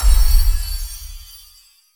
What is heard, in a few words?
correct target